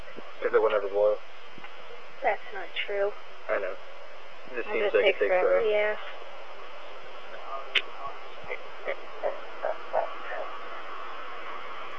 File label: laugh